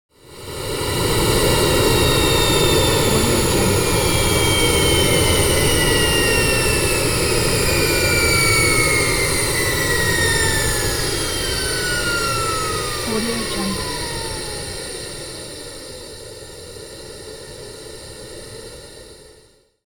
transportation jet plane honda private small turbine slow down